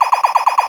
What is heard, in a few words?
TOY RAYGUN LOOP